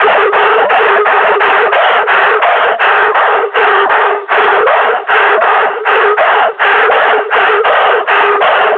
panting, combine grunt

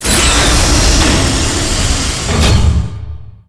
glass turret door open; Portal 2

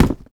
cardboard box impact hard

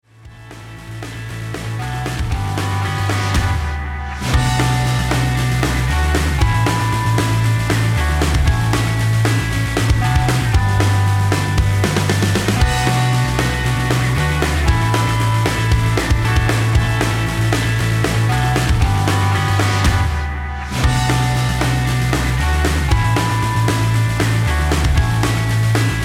bomb planted

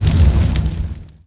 fire loop fadeout, molotov; weapons; Counter Strike Model Pack; CS2

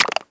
spew, infestation, bulb

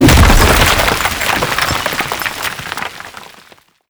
destroy, brickwall, destruction

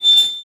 move, hinges drawers; Half Life Alyx; tap

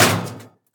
metal vent impact; physics

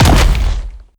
step; creatures; strider; seq passby

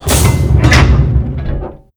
blast door open; ambient; Half Life 2; Episode 2; outland

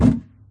plastic barrel impact soft; physics

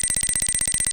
sensor detect hud; Counter Strike Model Pack; CS:GO; sensorgrenade; weapons

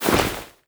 arm oneshot, foley

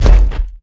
dog footstep run; npc; Half Life 2; Episode 1

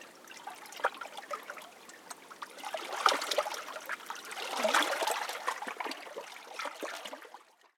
ambience canals water bridge; Counter Strike Model Pack; CS:GO

